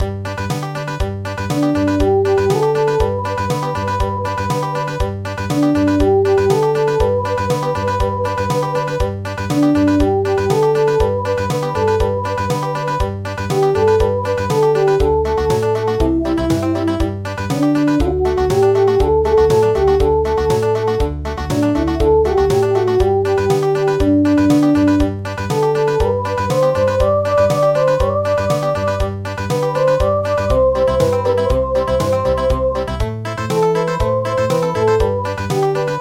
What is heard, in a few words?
Country